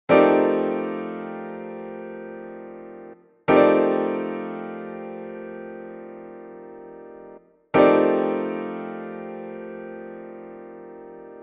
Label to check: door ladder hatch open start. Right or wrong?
Wrong